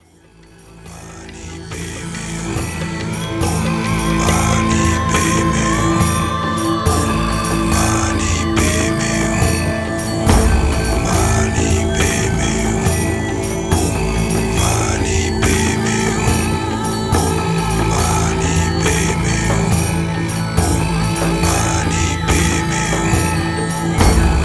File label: Om Mani Padme Hum